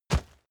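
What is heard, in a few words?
step leaves wet heavy, footsteps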